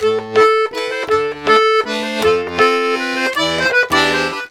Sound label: LARIDE, Accordions; USB Soundscan vol.40; AKAI